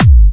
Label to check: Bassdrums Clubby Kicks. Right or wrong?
Right